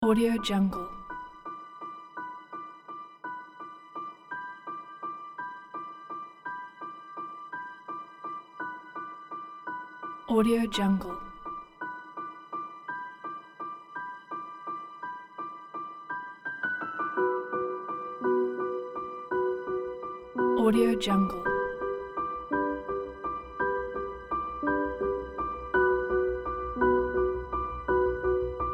cinematic underscore

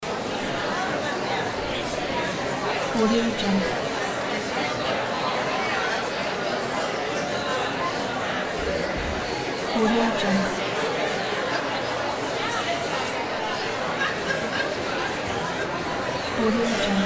crowd talking loop

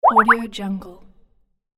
water drop bloop

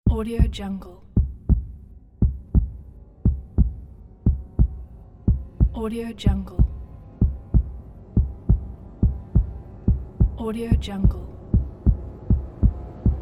accelerated heartbeat pack

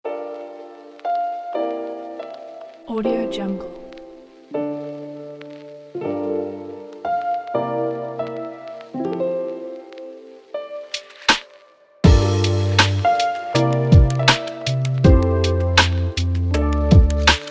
inspiring chill happy hip hop; joysound

